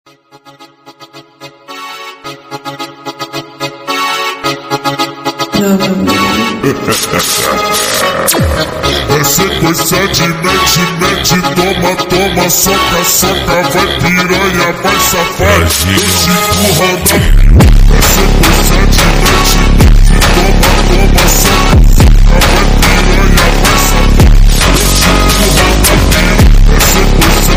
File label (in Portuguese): Acordeão Funk